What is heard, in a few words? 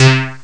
Hammer Bass, Amiga Soundtracker; ST-XX Amiga Soundtracker Sample Packs